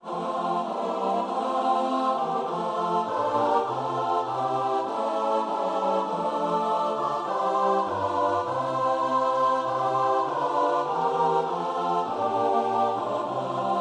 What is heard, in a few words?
choir